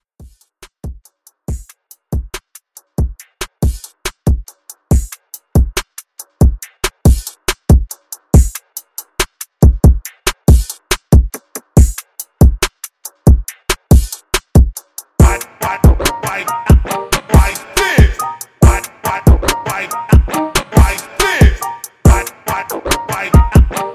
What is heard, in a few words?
Hip Hop Rap Scratch Redrum; Remixfreakz